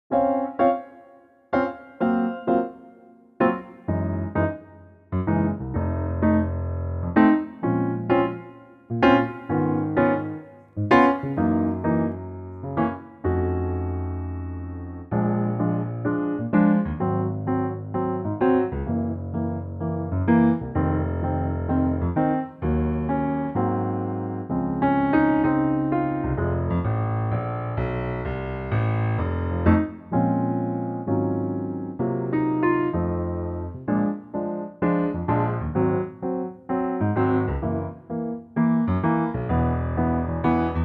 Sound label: Piano trak; key - Bbm & Bb - vocal range - Bb to F; Wonderful piano only arrangement